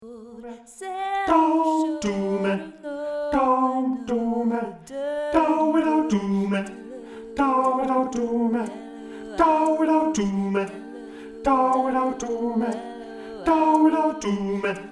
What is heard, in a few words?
TENOR